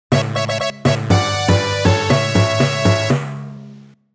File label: 1-Up; Sonic 1 OST Remastered